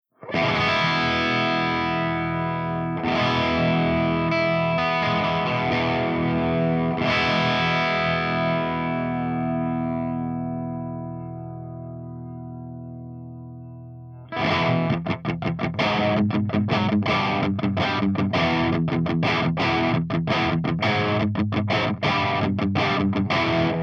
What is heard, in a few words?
EVH5150 CH2 CRUNCH V30